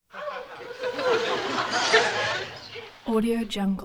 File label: crowd small audience